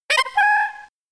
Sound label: Chatter; attack moves; Pokemon; Gen 4; DPPL HG SS